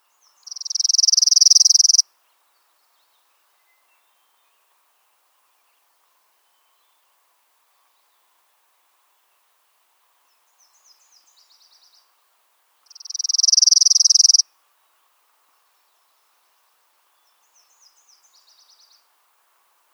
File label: Palm Warbler (Eastern)